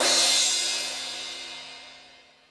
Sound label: Crash, Drum Machines E-MU Pro-Cussion